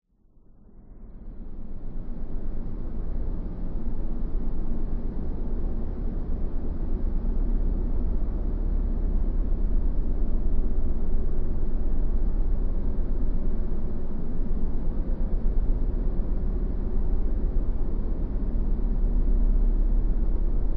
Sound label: science fiction ambience planet windy landscape short; zapsplat